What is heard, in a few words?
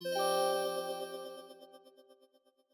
Clear Em7 Mid; Mixolumia